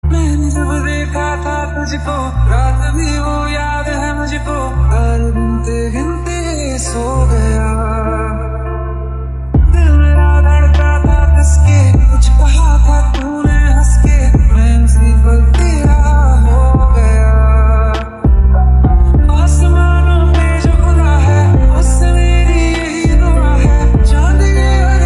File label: Lofi Remix